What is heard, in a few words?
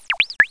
BLIP